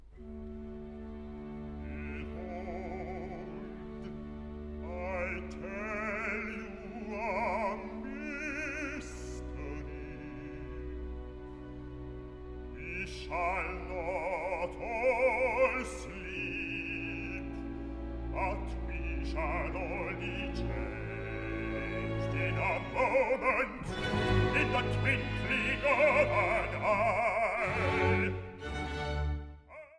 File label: bass; part 3, no 47, behold, i tell you a mystery